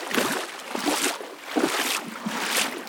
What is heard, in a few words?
walk water